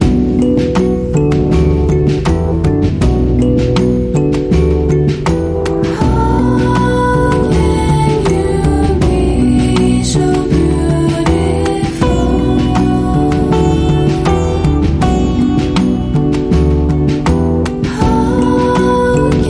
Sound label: Elegant Downtempo